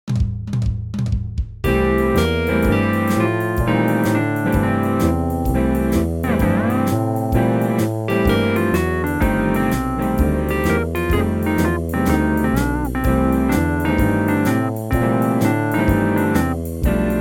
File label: MIDI blues